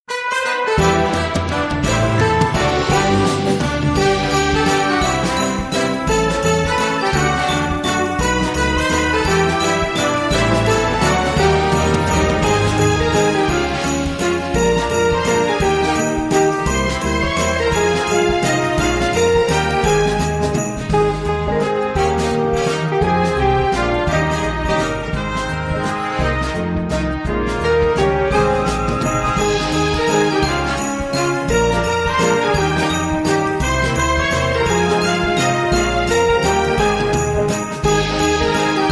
Mixed tracks - We Wish You a Merry Christmas (jazz classic) (Mixed)